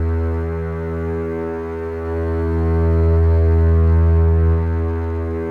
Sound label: Contrabasses E1